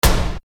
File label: Snare Dr